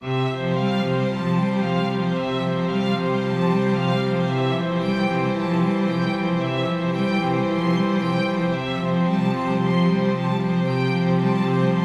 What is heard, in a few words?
uplift strings; LANDR